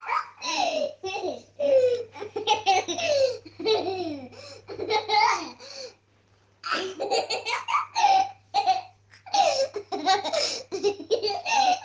laughing

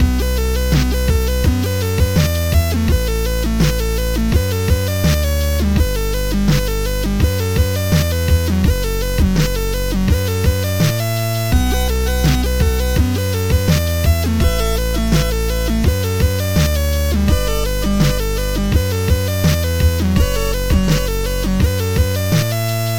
Best 8bit Tune

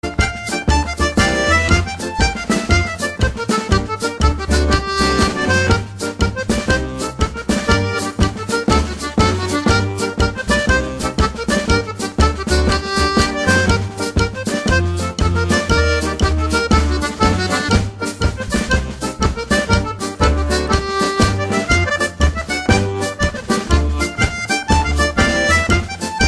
Irish Jig

